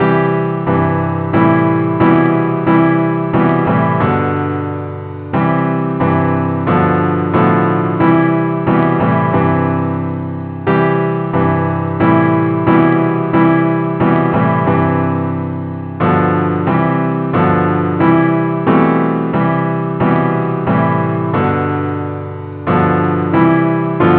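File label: advance accompaniment, key c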